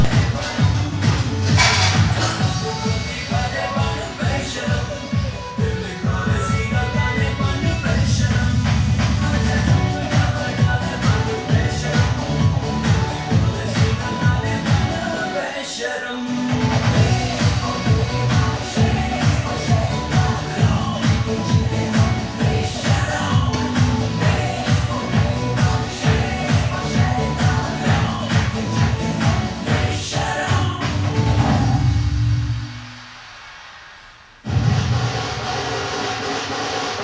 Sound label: indian song from TV